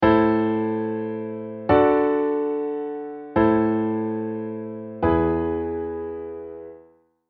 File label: Chromatic Alterations